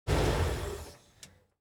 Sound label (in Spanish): CALADO MOTOR; SIMUZENSOUND